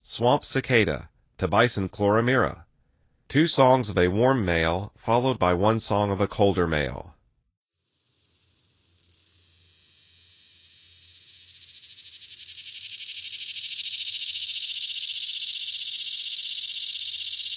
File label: Swamp Cicada